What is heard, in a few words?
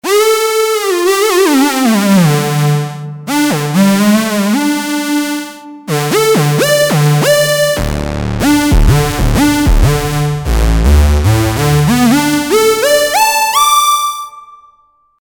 Monark Emersonstyle